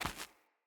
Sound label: Run Stone, Footsteps; Characters; Mother